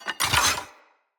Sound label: Sphere Sword And Board Equip; Weapons; Swords